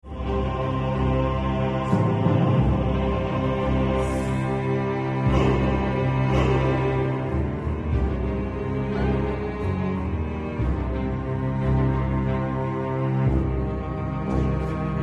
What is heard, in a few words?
death